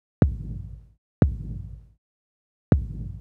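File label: Kick Noise; hydrogen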